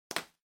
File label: Packaging Air Cushion Pop; 梱包用エアークッション; 弾ける; 梱包材